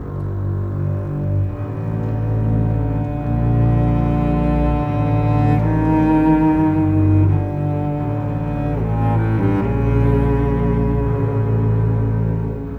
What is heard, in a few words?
Cinematic Strings, Orchestral